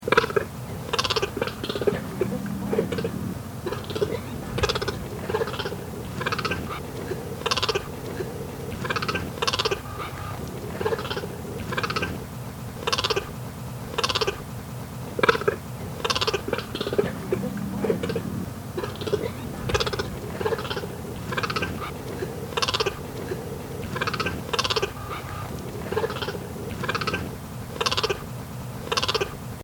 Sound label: cheetah stutterbark